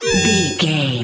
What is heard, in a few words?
alarm siren loop